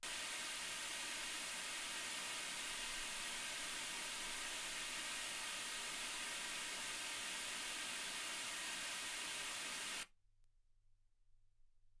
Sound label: wgn